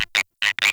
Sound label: Close River Frog; Ambience; Frogs